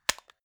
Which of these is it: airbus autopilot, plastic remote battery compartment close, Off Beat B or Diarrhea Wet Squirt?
plastic remote battery compartment close